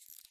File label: indicator; Ambient UI